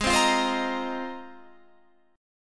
G sharp 6th Add 9th G sharp Guitar Standard; strummed; JGuitar

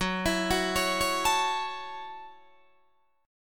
F sharp Minor 7th F sharp, Guitar DADGAD

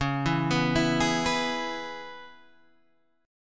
C sharp Minor 7th C sharp Guitar Standard; C#m7; arpeggiated; JGuitar

